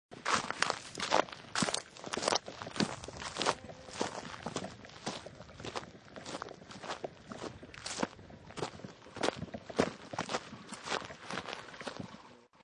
Footsteps On Gravel And Leaves, Walking on country road with gravel; Footsteps on Gravel and Leaves: Walking on country road with gravel, some leaves and twigs scatters and breaks.; Lynne Music